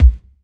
good kick drum b key

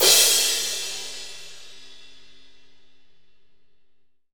big room crash cymbal one shot g sharp key

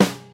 low snare one shot f sharp key

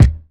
original kick drum D sharp key; kick drum samples; one shots

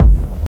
dark steely techno kick reverbed; one shots; kick drum samples

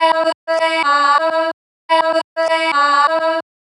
chopped vocals female - em - 127

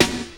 eighties crisp acoustic snare sound e key

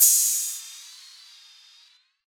open high hat g sharp key; open hat samples

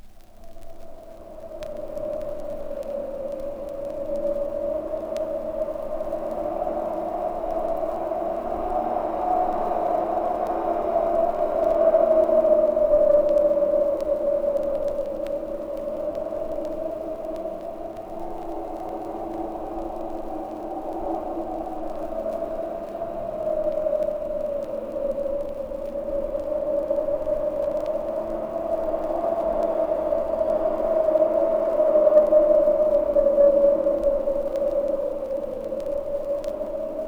eerie wind - horror effect - vinyl; Equipment used: TTA05USB akai player and focusrite 8i8 interface, using an SSL limiter; Recorded from Sound Effects - Death and Horror rare BBC records and tapes

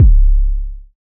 nice bass drum sample c sharp key; kick

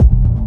modular techno kick long tail; kick drum samples

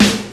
phat snare g sharp key; snare drum samples; one shots

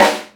original snare single hit D# key; Duration 0:01; Bitdepth 24

